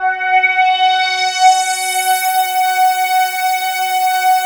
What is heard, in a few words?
F#4, PROPHET VS, Vintage Keyboards; Big Fish Audio - First Call Vintage Keyboards